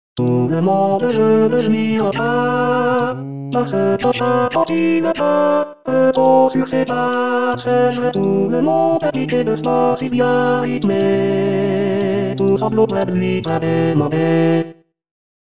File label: Tenor; Variété